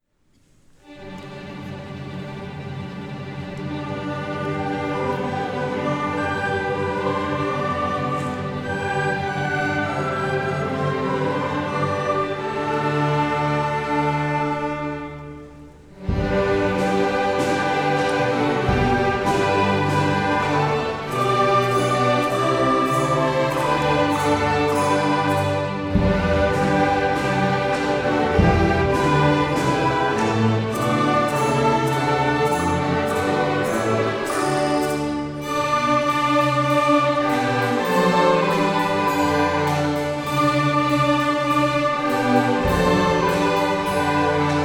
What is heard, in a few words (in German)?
Orchester Weihnachten